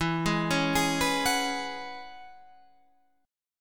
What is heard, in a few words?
E 6th Add 9th E, Guitar Standard; E6add9 arpeggiated; JGuitar